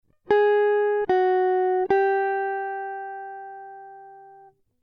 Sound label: jazz guitar scales